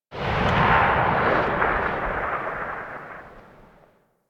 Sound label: Rifle Tail; Weapons; Warframe; Thanotech